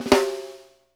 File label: RUFF, SNARES; Sampleheads - New York City Drumworks VOL-1; SW SNARES